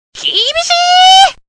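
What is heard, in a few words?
voice old male shock; Flying Metamorphosis Queen of SM Bar Fuate Co; android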